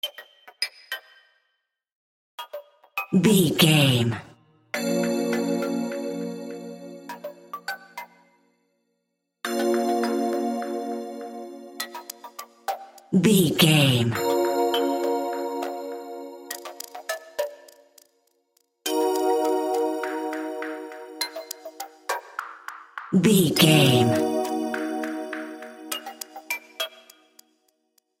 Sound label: mysterious task video game